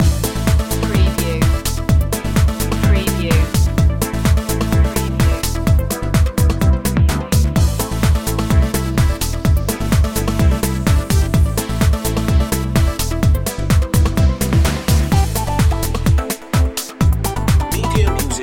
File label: synchro express loop